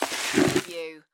Sand Pail Slide Move; sound effects bible

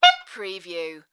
Sax Squeak

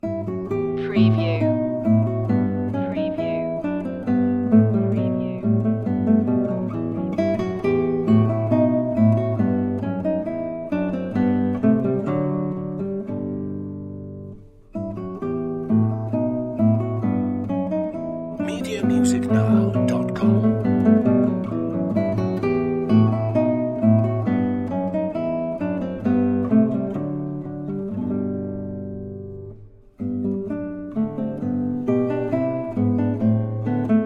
bourree e minor; bwv996; classical music for media

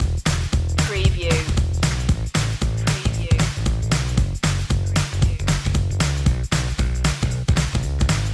rock me indie loop

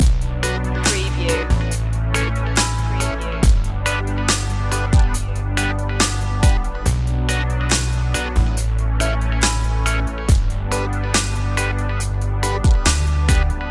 take off loop

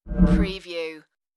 computer interface message

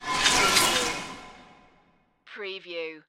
Whoosh Metal

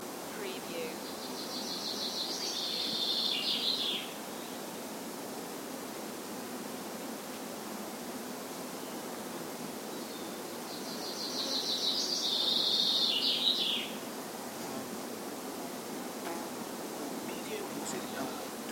Welsh Riverside with Birds and Insects Loop; beanstalk audio

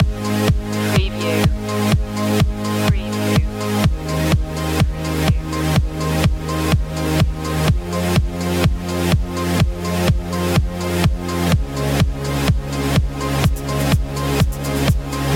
heartburst loop

